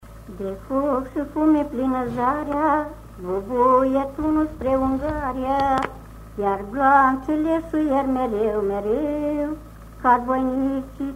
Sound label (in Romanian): Bubuie tunul spre Ungaria; Fonoteca Arhivei de Folclor Vasile Adascalitei - CJCPCT Vaslui